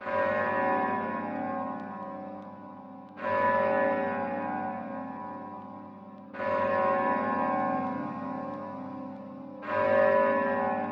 Bells

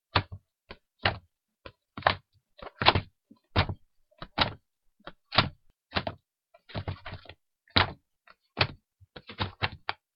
Book Drop

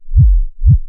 Double Deep Bass Kick

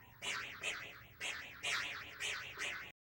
Growl flex

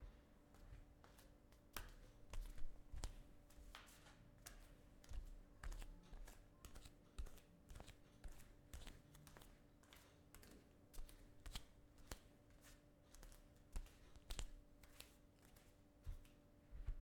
Feet No Shoes Walking

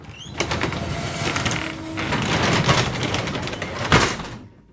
Door Garage Door Close

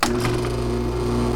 Generator On

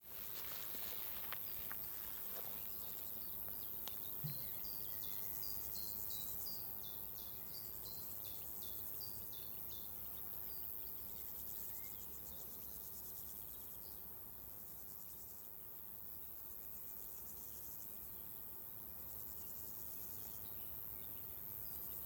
Summer Ambience

